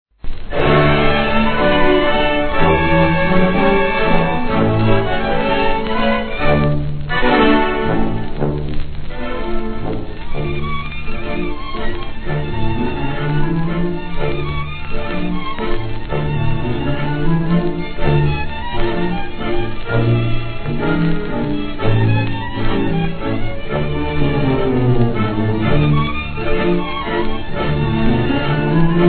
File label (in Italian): Orchestra Jazz Sinfonico